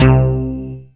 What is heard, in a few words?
MOOG; Rubber Chicken Software Sample Bank